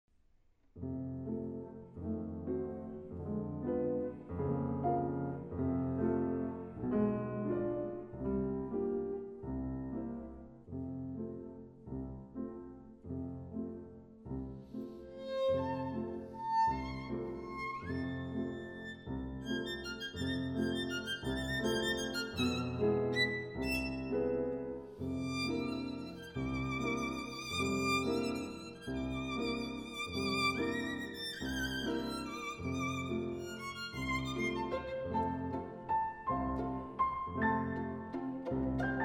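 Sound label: vln son, violin sonata; rabl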